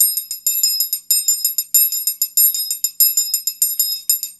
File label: Triangulo